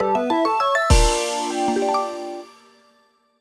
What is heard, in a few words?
Jingle Opening Western; clubhouse games 51 worldwide classics 2020 switch gamerip; Light Board